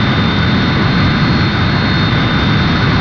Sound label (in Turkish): uçak motor; tasitlar